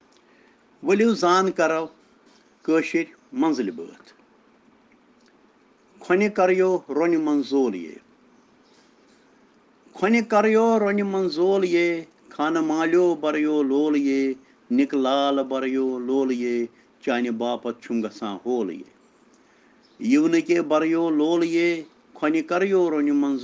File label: Children Rhyms in Kashmiri